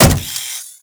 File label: Riot Moa Step Default, Footsteps; Warframe